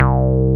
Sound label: BAS Mix Moog; Best Service Gigapack 2